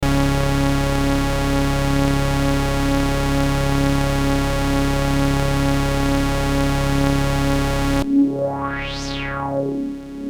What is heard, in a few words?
Synth; ARTURIA FILTER M12